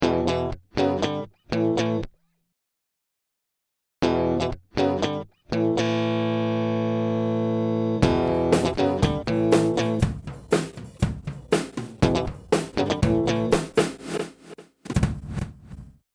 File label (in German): Guitarloop versch Phrasieru